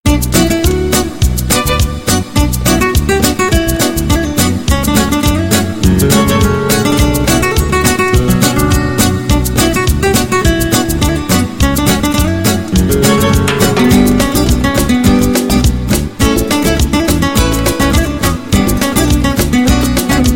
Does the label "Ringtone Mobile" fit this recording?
Yes